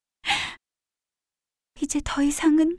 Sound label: Lose Point; Shua